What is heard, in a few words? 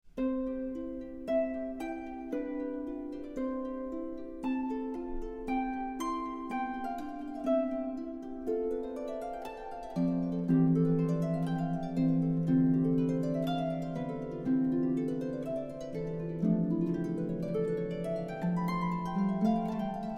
Allegro from Sonate C major